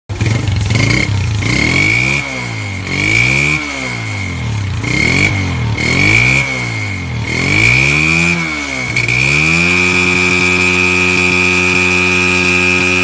Shirazi exhaust

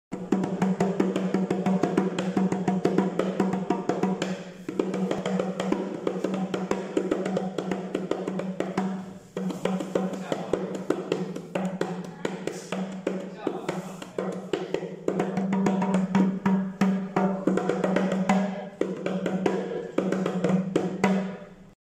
Bongo drum

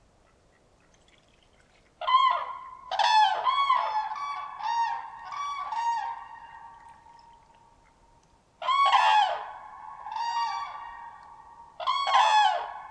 Common Crane

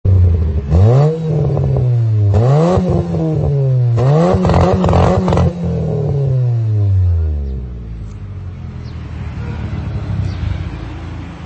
Exhaust silencer

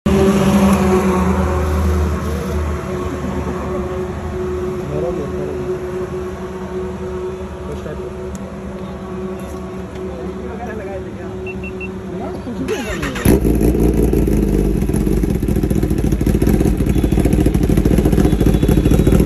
kawasaki ninja zx

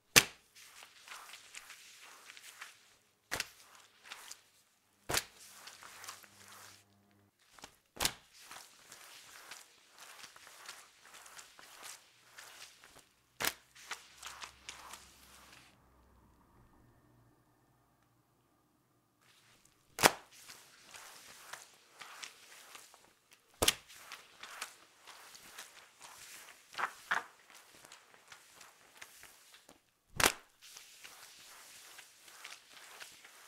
Mop

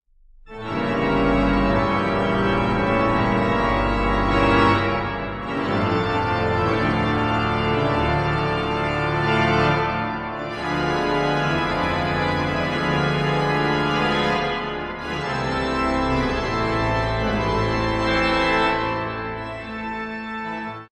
Organ

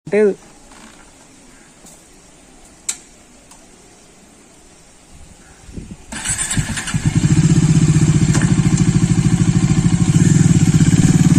Bajaj dominar

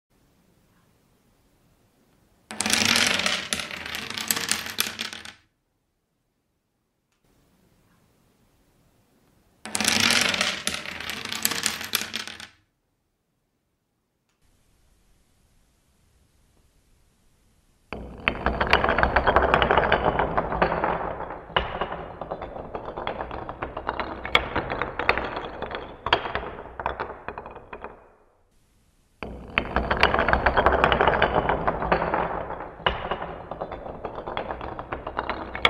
Domino

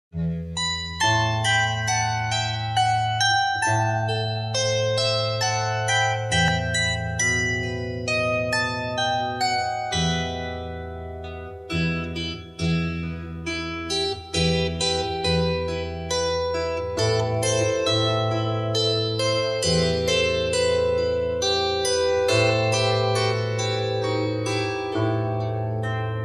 bandura

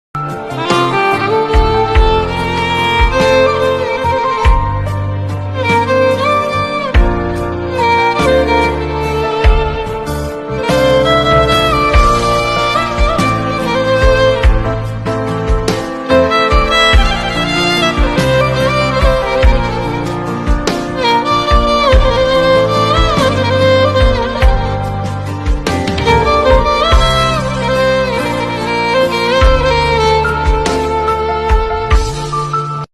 violin

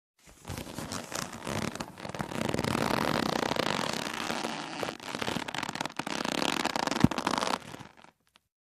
rope

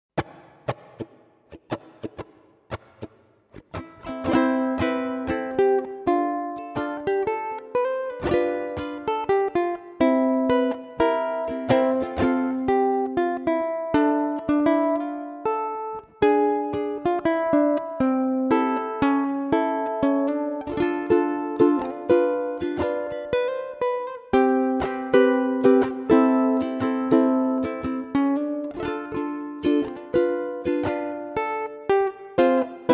Ukulele

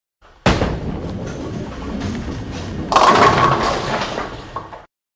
Bowling

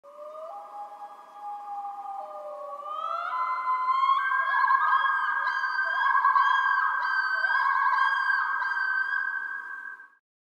Loons

